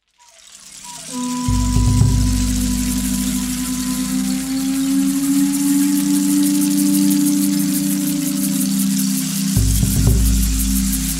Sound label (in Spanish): MUSICA MAYA